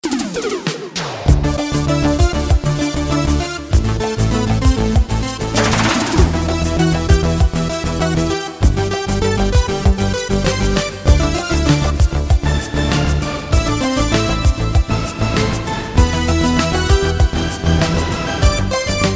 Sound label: Sounds like a melody